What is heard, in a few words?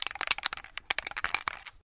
Rattlecan